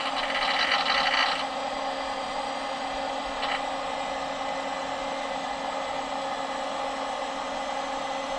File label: computer hum loop; pipeline